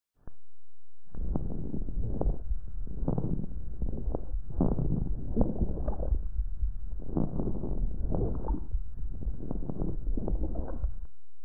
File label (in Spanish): Estertor